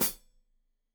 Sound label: Hat Foot, Hi-Hat, Cymbals Stereo